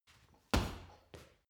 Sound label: punching bag kick hard